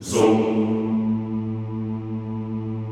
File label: ZOOMMM A1, Classical Choir; Best Service; Extended Classical Choir